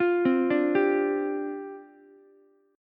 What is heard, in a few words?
C Suspended 2nd Suspended 4th, down, Ukulele; Csus2sus4 arpeggiated; JGuitar